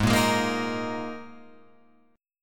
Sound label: Ab Suspended 2nd Guitar Open F; strummed; JGuitar